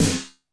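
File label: FX ROOM Snare 909; Masterbits - Soniq Elements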